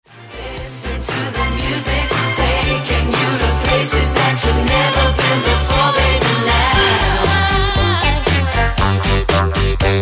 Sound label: arsecrackbeat